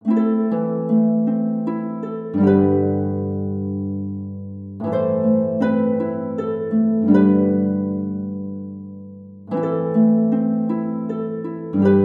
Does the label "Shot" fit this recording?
No